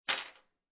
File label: Keys Drop On Wood Table, Foley, Keys Dropped Onto Wooden Table; Drops; Objects